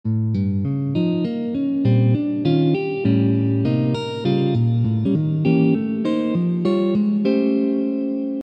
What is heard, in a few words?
etude 200bpm, jazz guitar